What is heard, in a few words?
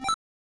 pop; 主播女孩重度依赖